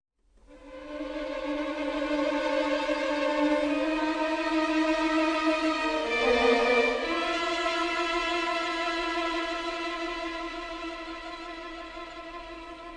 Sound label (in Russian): tremolo